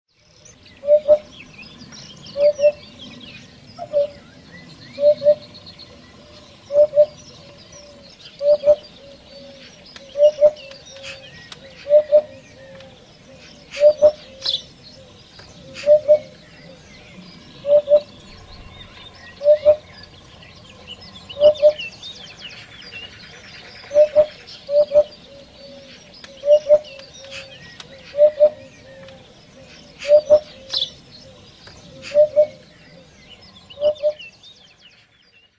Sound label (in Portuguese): Canto da Poupa Africana